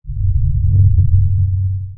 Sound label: sonar Ping Air Far Beacon Directional; Barotrauma; Real Sonar